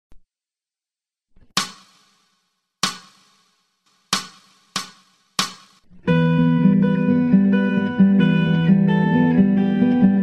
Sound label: Romanza FAST 2X 80, Gitara